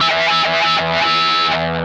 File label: OVER WAH, Funk Guitar; 90 s Sample CDs; Zero G